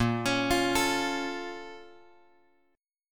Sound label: A sharp Minor Major 7th; A#mM7 Chord {6 X X 6 6 5} arpeggiated